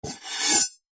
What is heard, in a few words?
warfare knife metal blade edge scrape wood